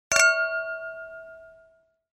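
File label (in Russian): изысканные бокалы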